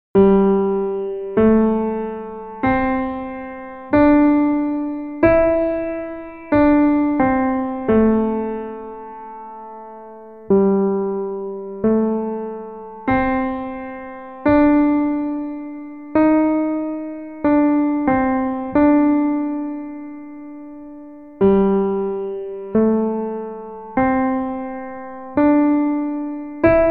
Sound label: Solo